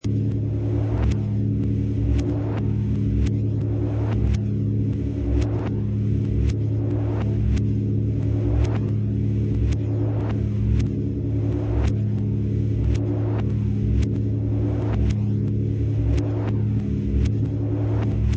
Evil Machine Room Loop, Eerie electrostatic or nuclear machinery; Evil Machine Room: Eerie electrostatic or nuclear machinery fluxations; Lynne Music